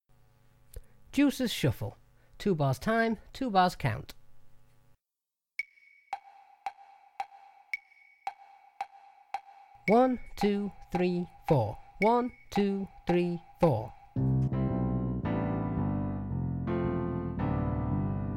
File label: Shuffle Backing, drum kit; Deuces